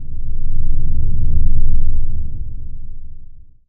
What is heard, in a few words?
breath, underground